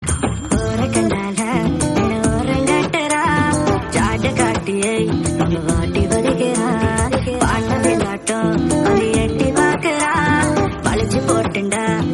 remix bgm

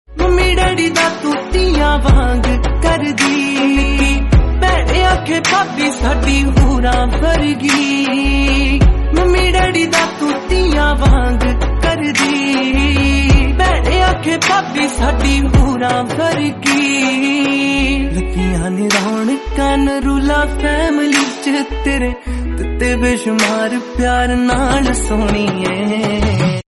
punjabi song

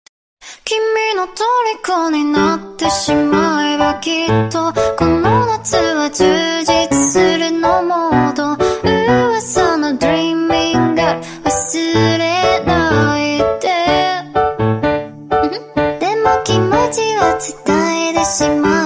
marimba remix

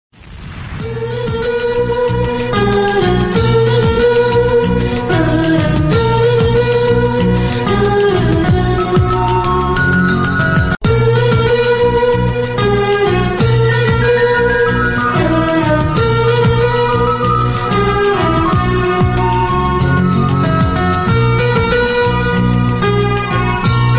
dj remix telugu song

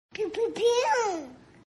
pi pi pew notification tone

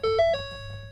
ford door dinger

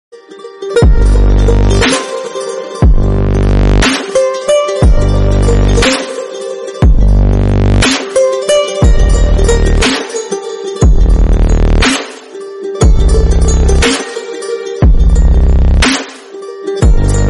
instrumental viral bgm

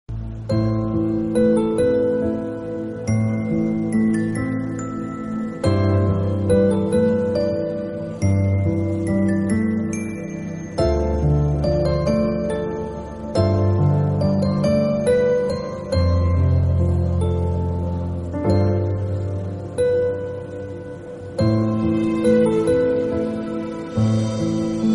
turkish romantic

